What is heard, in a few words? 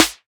Snare Swisher, drums